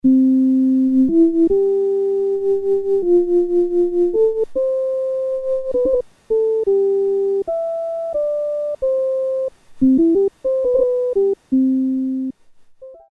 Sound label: Flute, Korg PolySix; Factory Presets Bank A